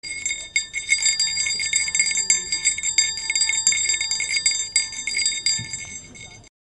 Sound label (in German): glocke